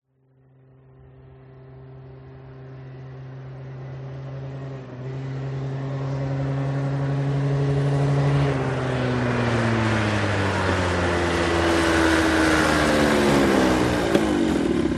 PIAGGIO ZIP 49cc External Approach and Shutdown Fast Distant Following; gain walkers